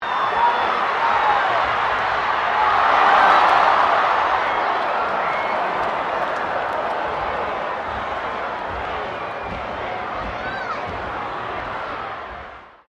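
crowd 15K Rugby World Cup boo, Rugby world cup game; Crowds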